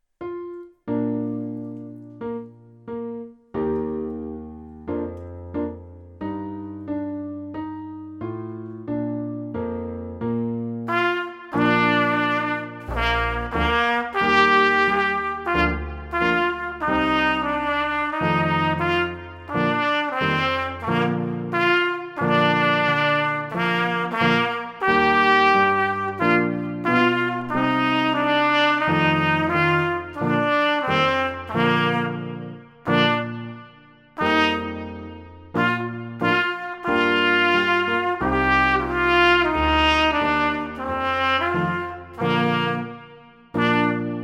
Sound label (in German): Trompetenfibel